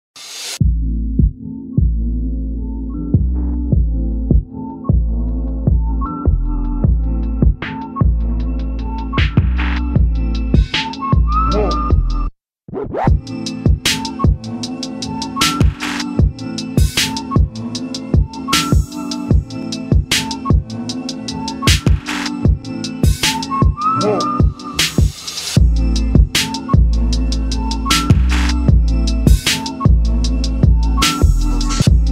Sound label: instrumental